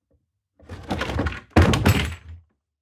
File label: open basement door; foley walkers